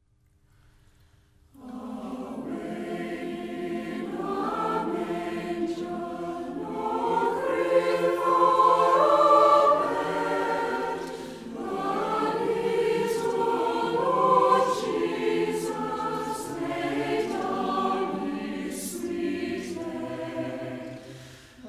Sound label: Away In A Manger, Choir; Brisbane Concert Choir